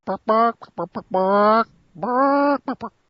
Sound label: chicken sounds